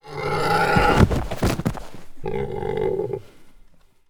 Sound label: death; Rust